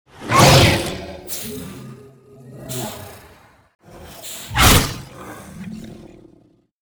worm agitated idle; LoL; monsters

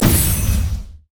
lux lightbinding; LoL

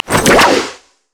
wildcards; LoL; twisted fate

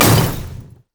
barrell roll boom; LoL; classygragas

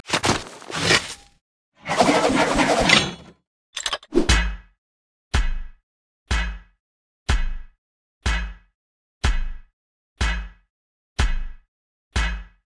recall lead in; LoL; vi skin02

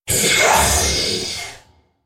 Droid spellcast effort

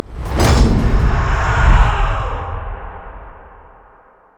terrify; LoL; fiddlesticks

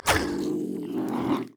wolf angry; LoL; monsters

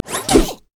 steam golem basic attack; LoL; blitzcrank

